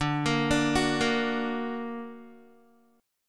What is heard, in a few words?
D Augmented, D, down, Guitar; arpeggiated; 4E,4A,5D,5G,5C,6F; chord